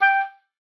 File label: G5, OBOE; Xsample VOL-6